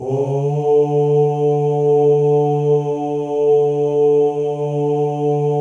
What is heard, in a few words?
male ohs; Spectrasonics; Symphony of Voices